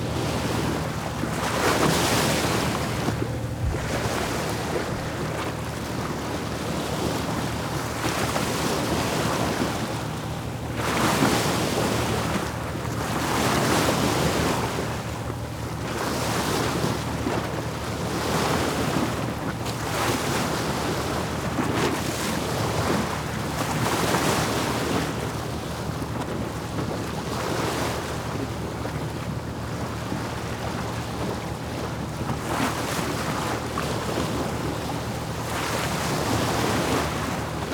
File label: Water Splashing, Sailing, Small, Fishing, Ship